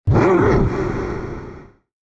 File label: Stomp; Satan